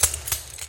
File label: staple